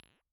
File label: Bottle Struck